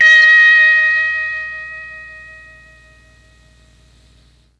D#4 GUITAR, Mellotron MKII; Mike Pinder - Mellotron Archive CD

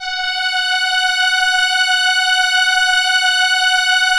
ARP STR ENSL, First Call Vintage Keyboards; SE ENSMBL

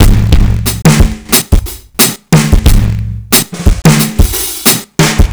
Street Tip, R&B Drums

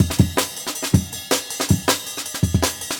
Jungle Breaks; Original Jungle Breaks

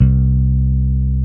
BASSES, Roland

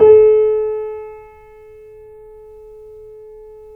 C7 PP, The Ultimate Piano Collection; East West

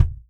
Real Drum Kit, ROCK KIT; Best Service ProSamples vol.43 - Real Drum Kits

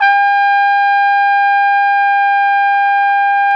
Flugelhorn; Roland L-CDX-03 Disk 2

